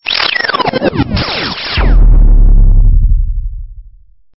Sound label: Stinger; STINGERS